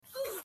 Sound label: ominous footsteps